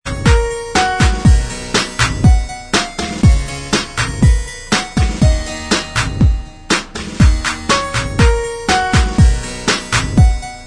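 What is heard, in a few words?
instrumental, backing track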